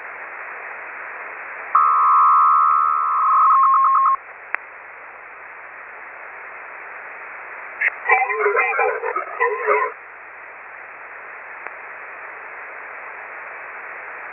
HARRIS RAU and AVS, HARRIS RF-3575 RAU or RF3560C TIU with male voice prompt and ring tones; HARRIS RF-3575 RAU with male voice prompt using Harris Analogue Voice Security (AVS) and 150 Bd BELL 103 AFSK signal